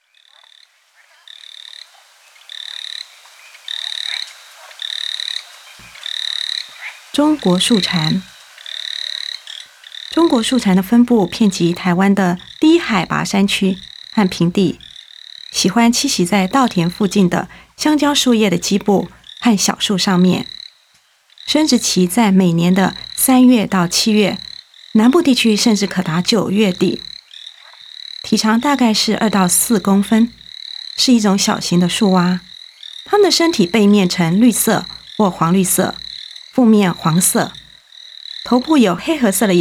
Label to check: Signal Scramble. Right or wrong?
Wrong